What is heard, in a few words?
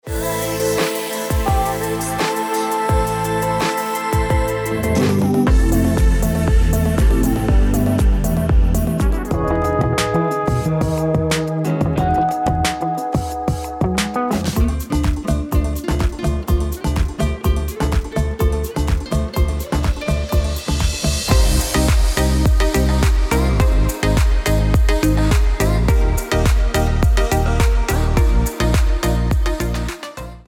Smooth lounge